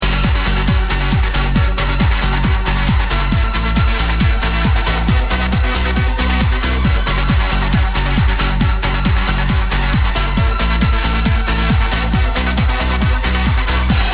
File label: music; space shuttle; games